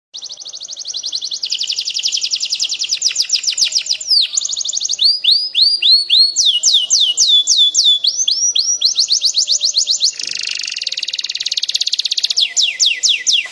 suara kicauan kenari standar

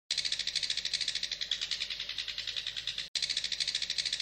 varian lagu burung cendet

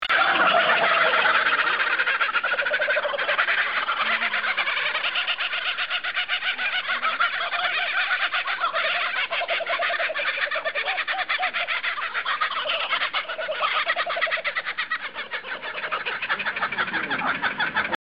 suara tertawa sepasang burung kookaburra

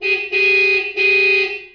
suara mobil

